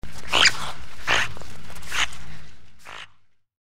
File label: gecko vox; npc